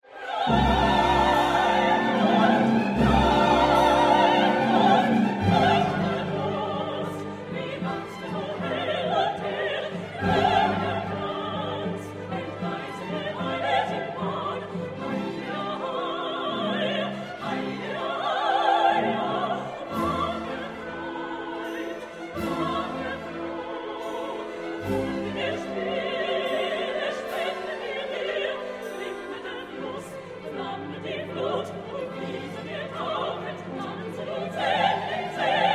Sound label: wagner rhinegold chorus